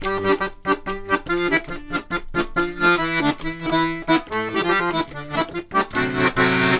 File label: Accordions; USB Soundscan vol.40; AKAI